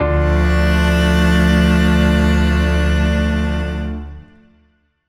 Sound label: full ensemble one shot Dm